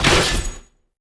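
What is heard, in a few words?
impact sword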